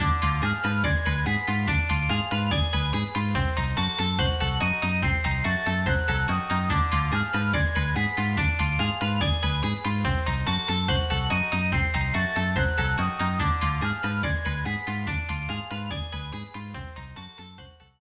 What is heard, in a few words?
BGM; Jajamaru Jr. Denshouki Jalecolle mo Arisourou; GBA